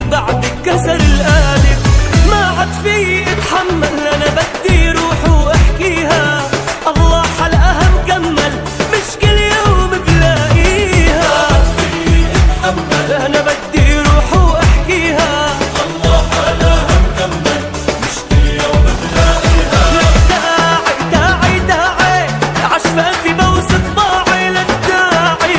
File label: latest arabic ringtone